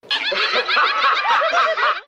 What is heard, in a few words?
laughter; Voice; ooh ahh; evil dead